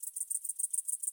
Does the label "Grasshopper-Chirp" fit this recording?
Yes